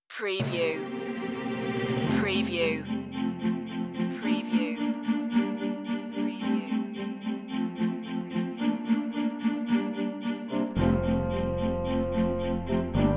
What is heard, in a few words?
jingle bells